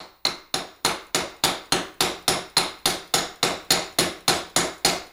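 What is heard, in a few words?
Hammer Chisel On Cement; hammers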